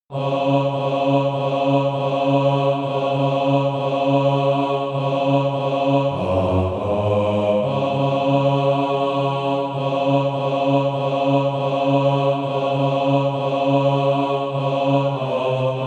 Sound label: bas